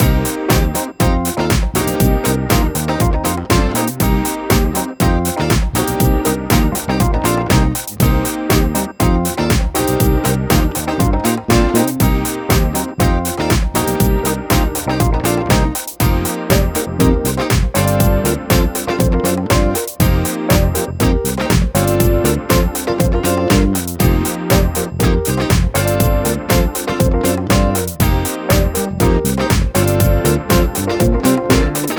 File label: Nu Disco, 120bpm Cm, Full Mix; Zero-G; Construction Kits; Legacy